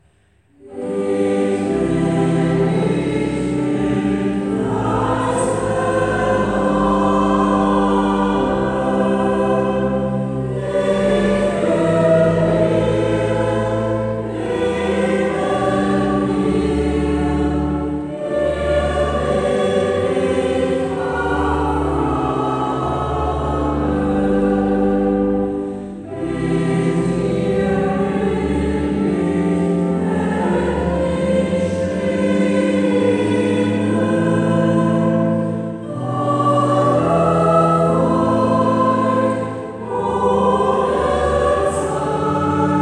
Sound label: Choral; Bach